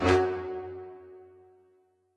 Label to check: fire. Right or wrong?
Right